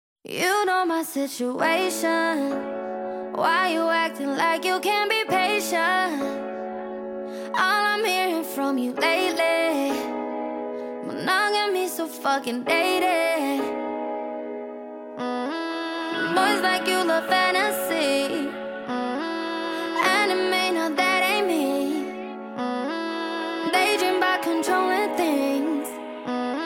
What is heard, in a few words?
Commercial Jazz; show; showmusik; dans